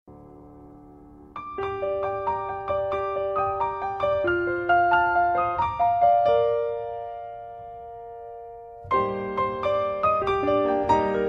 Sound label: piano duet; Electronica; Corpse Bride